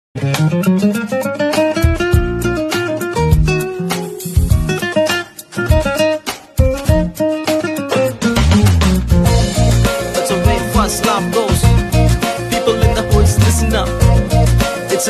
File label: bgm ringtone, tamil bgm